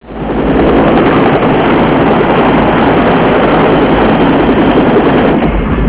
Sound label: helis